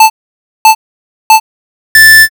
countdown

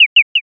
sparrow

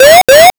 warning

